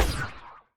shoot magic; Epic Toon FX

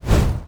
shoot fireball; Epic Toon FX

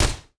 explosion bullet; Epic Toon FX

